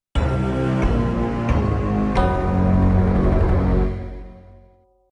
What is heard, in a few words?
altar loss; TGOP